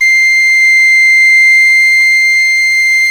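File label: PENNY WHISTLE; Exotic Winds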